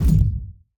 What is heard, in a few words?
Hand Paddle Attack Hard